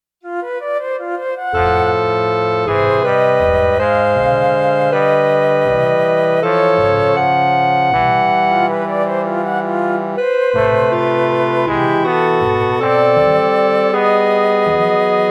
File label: Sisyphus quintet